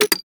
sparks quick Stop; netent

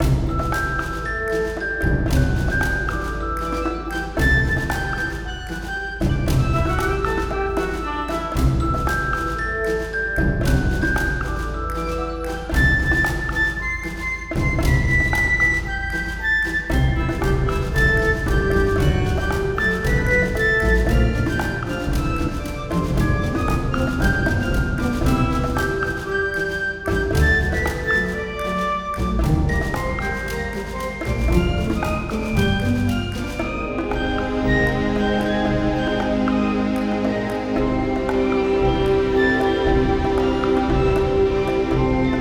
free spin theme; netent

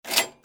cash register up; netent

